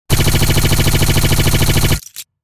Sci fi auto rifle long burst

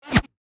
Sniper bullet pass by

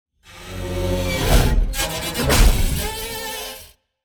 Metal wires transitional impact

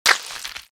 Vampire bite aggressive attack

